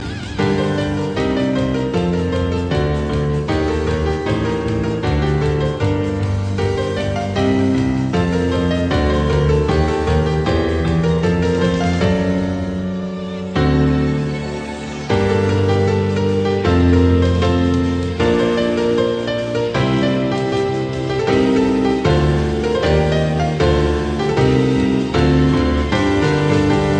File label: piano ringtone